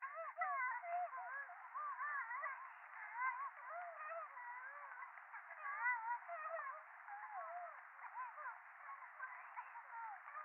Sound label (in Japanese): 電話 可愛い喘ぎ 雨